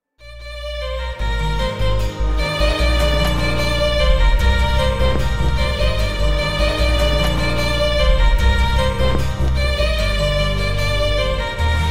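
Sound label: background music Ringtone; Sanam Teri Kasam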